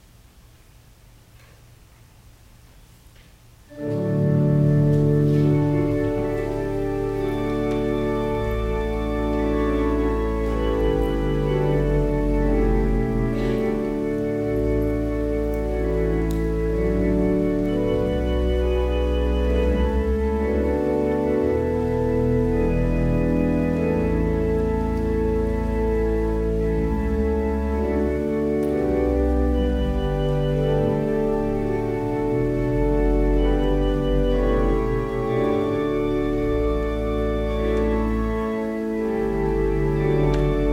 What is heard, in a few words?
Organ Music